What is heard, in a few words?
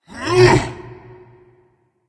grunt; smite; geb